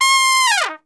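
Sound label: C 4 TRP SHF, Trumpet; Best Service; Brass Super Section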